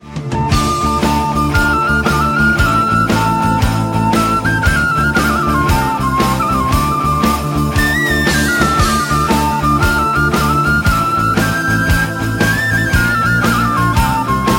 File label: norske ringtone